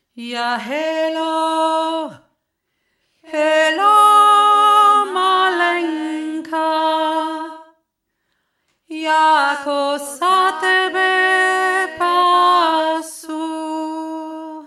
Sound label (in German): ja helo malenka obere stimme